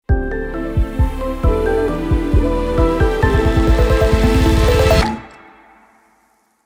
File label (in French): logo avec drums; Time for the Planet